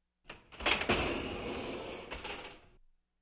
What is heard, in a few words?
Open sliding door